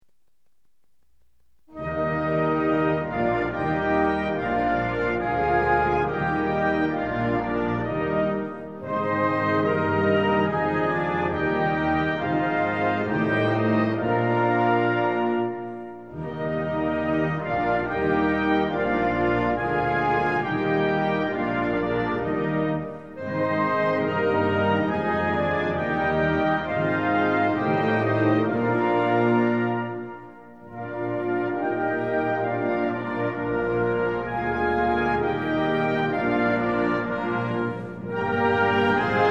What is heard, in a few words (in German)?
Deutschlandlied Classic